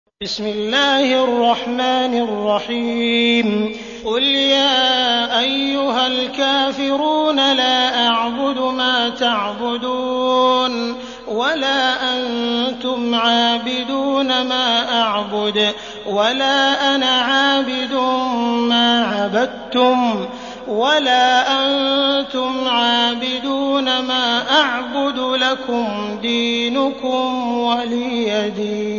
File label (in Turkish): Kafirun, kuran; sudeysi sureler